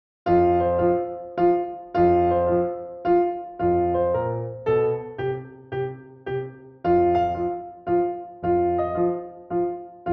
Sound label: vivaldi autumn III